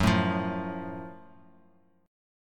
F sharp Minor Major 7th F sharp; strummed

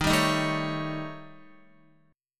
Eb 7th Flat 5th, Eb, Guitar; Eb7b5 strummed; JGuitar

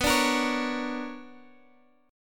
B Minor Major 9th

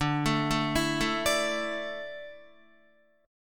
D Suspended 2nd D down Guitar; Dsus2; arpeggiated

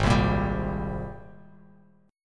G sharp Major 9th G sharp, Bass Standard; G#M9 strummed; JGuitar

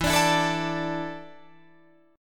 E 7th Suspended 4th, Guitar, Drop D

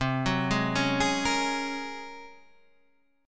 G sharp 11th C

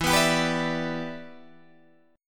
E Minor 7th, down, Guitar, DADGAD; Em7; strummed

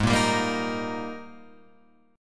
Ab Major 7th Suspended 2nd Ab Guitar Open F; strummed; JGuitar

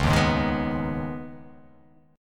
C sharp 7th Suspended 2nd; down; chord shape

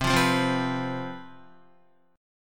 C Major 7th Suspended 4th, down, Guitar; strummed

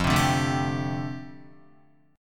F 6th Add 9th F down Guitar, chord

